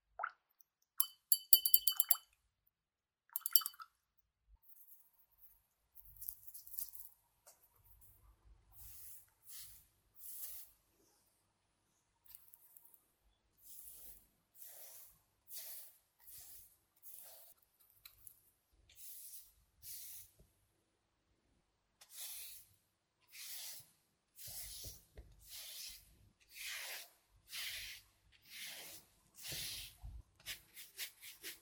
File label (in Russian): zvuk tonkoj akvarelnoj kisti; Звуки кисти художника или маляра; звук рисования